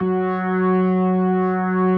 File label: F#3S, B3, Vintage Keyboards